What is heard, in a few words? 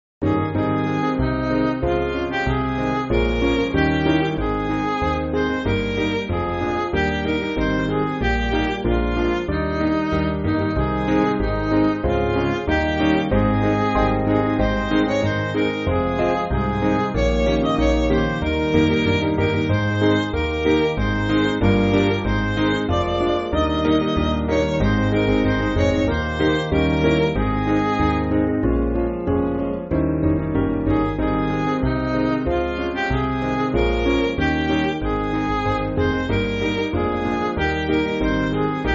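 Midi, Music Backing Files for Churches; 4/Ab Chord Sheet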